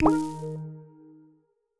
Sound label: fail